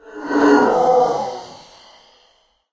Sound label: Ghost Wound Crit